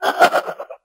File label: laugh 8 bit evil guy; ooh ahh